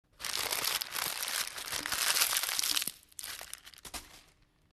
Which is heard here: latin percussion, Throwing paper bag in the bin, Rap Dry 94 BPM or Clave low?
Throwing paper bag in the bin